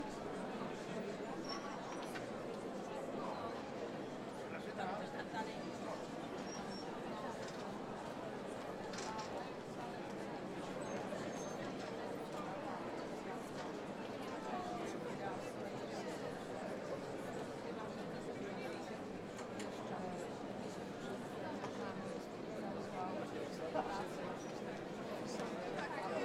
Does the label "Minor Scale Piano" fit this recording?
No